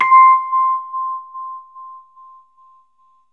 Rhodes octave C, R; 3D Sound; 3D Keys VOL-1

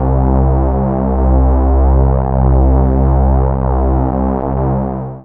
Single Electric Basses, Dance Floor Bass

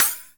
CYM CYM FX, Analog Drums; Roland L-CD701

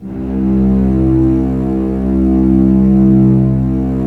STR SEC, Roland LA Composer, Sec Mute St; Roland - LA Composer vol. 1

